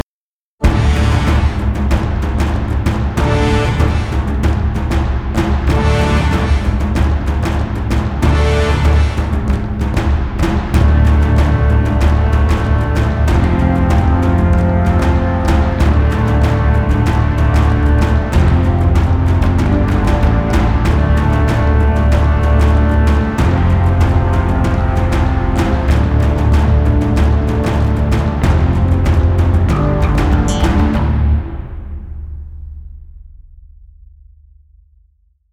Without Bagpipes; Moll Theme; Real Warfare 2 Northern Crusades

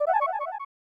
Item Pickup

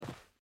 land generic

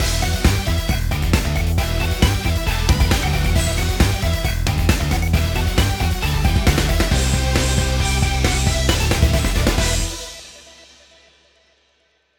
Inning; Derek Jeter Real Baseball 2009

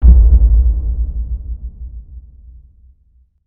Subwoof; chivalry medieval warfare 2012 pc

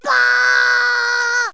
Vox Rab Bwa; Rabbids Big Bang; Android iOS Windows gamerip 2013

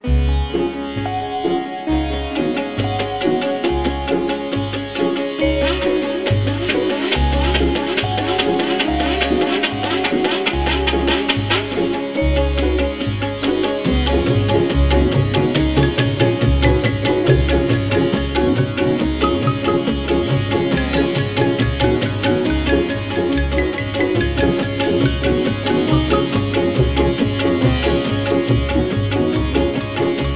Tuba

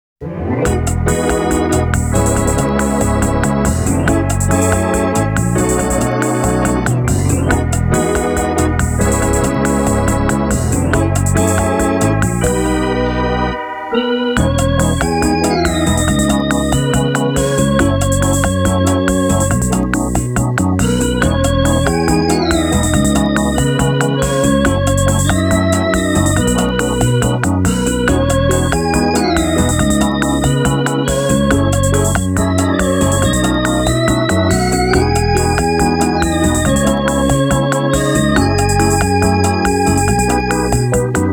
INTEGRAL ORGAN; fantavision

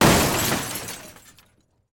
Crash

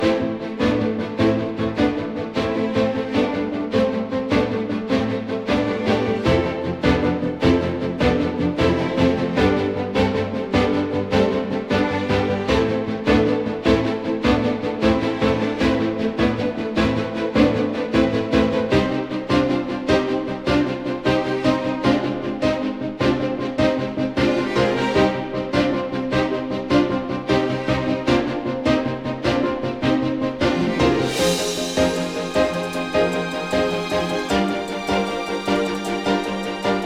Battle Loop Calm

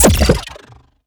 Shot Small Blaster Pure Fusion

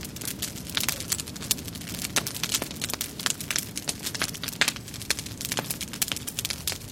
Burn Vegetation

